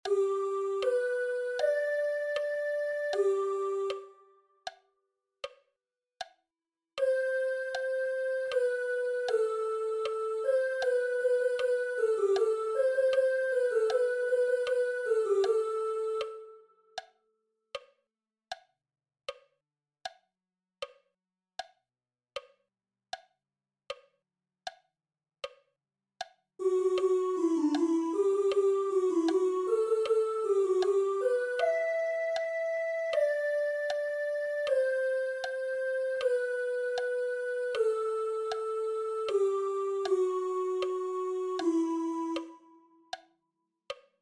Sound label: sopranos; telemann laudate jehovam part3